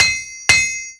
repair; The Elder Scrolls III - Morrowind; Morrowind GOTY GOG

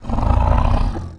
scrm

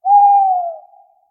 Tawny Owl; SyrinScape

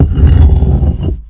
STONE; The Elder Scrolls III - Morrowind

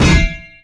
steam RIGHT; The Elder Scrolls III - Morrowind

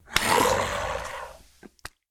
Ghoul Growl; SyrinScape; East Ward

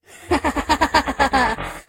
laughs; Nemira; SyrinScape; Iris hill manor

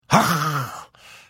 Hit, Vo

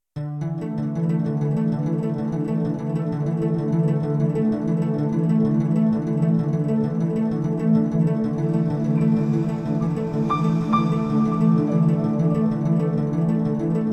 Flooded Cavern music; SyrinScape

